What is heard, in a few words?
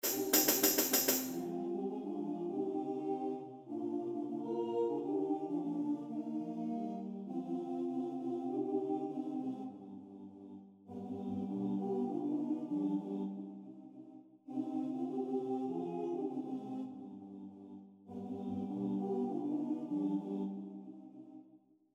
CHOR klein hop hop hop; Loli phabay; Ando Wurdon